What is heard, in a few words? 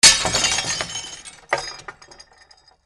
FALLING